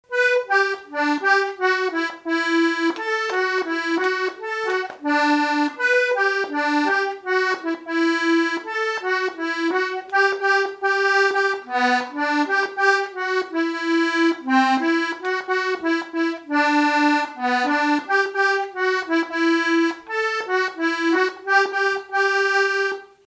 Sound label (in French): Polka